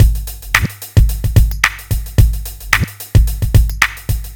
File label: r n b kit 110